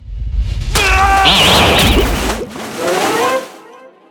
freespins reels enter; Hungry Shark; Wazdan

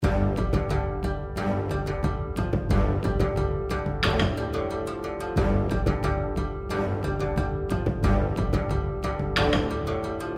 bgm; Ming Imperial Guards

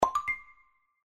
maximize; X Elements

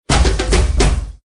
WIN TINY; Gorilla

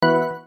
tick; Vampires

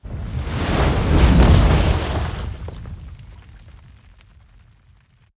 bonus wheel appear; Wild Ape

